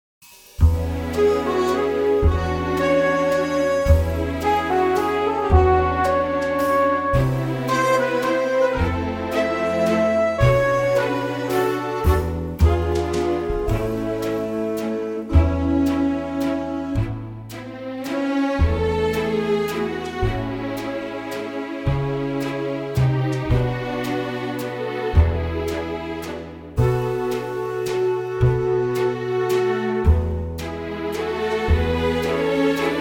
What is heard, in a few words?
A orch